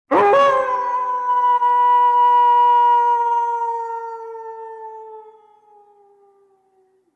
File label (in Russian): voet na lunu